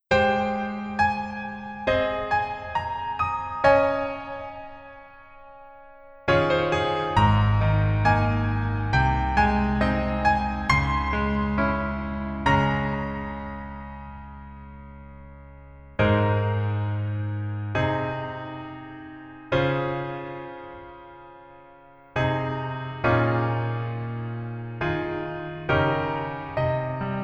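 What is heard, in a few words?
Piano version; 하이바이, 마마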